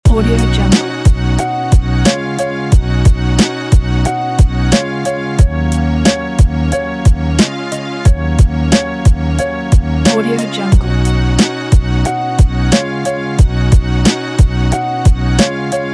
hiphop loops

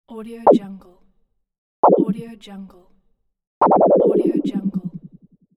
wrong button

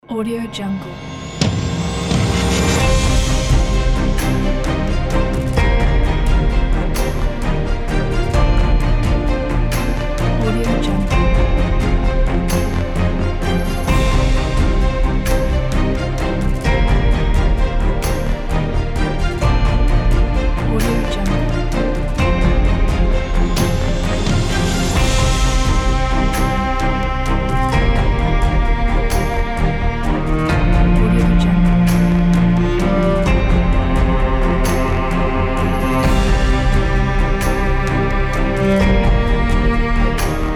time to win

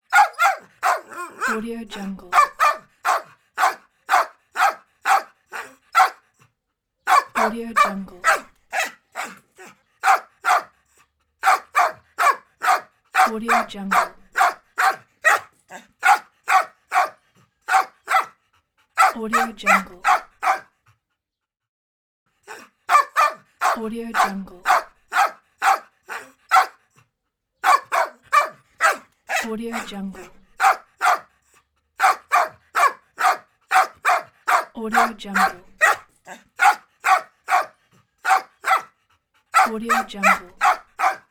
barking dog; moonaudioproduction

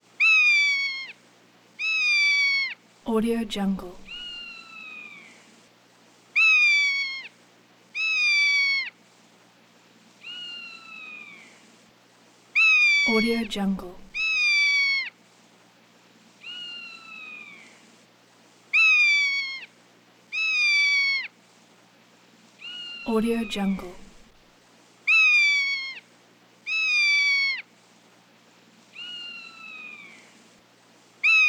hawk cries plaintive shrieks screams dialogue

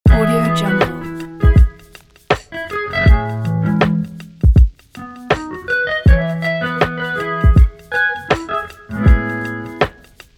lofi; cold-fire

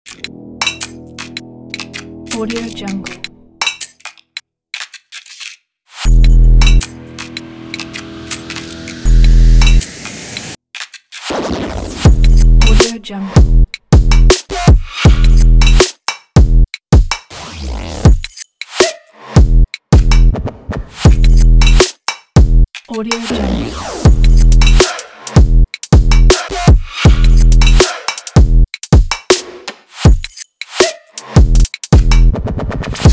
modern percussion promo

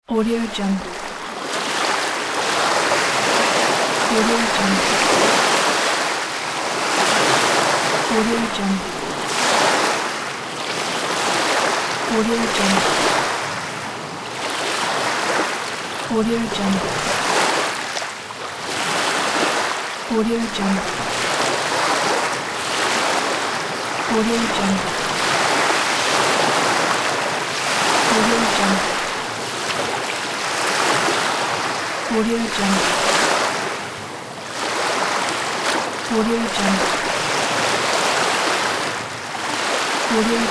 soft sea waves

